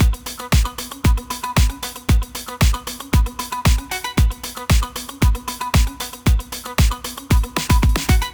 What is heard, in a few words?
drums; rhythm-quest